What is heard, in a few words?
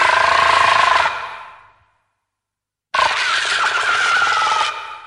hedorah kaiju